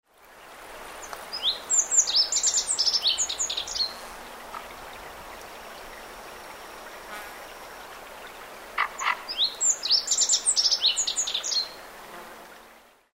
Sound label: rock bunting